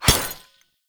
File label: Glass Shatter Damage Hit Effect; Warframe; Warframes